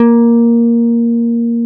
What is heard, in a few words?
FEND P-BASS BF3; Sonic Reality - Vintage Timetraveler